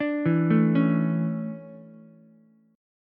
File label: D Major 7th Suspended 2nd, Ukulele; DM7sus2; arpeggiated; JGuitar